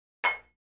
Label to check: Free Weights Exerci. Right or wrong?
Right